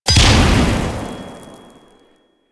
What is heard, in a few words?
Flame Spear Throw Explosion A, Grineer, Weapons